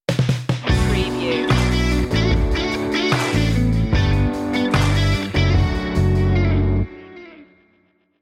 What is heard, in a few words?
give a little stinger